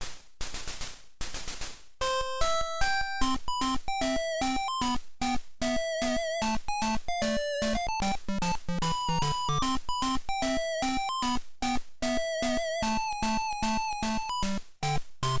PSG版; fray msx remastered soundtracks 2008

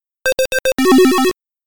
ルーレット・アンラッキー音

